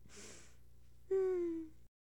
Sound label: Massage; Bathroom; Hilzartov; Fairy Size Doesn't Matter